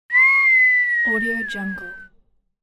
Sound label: whistle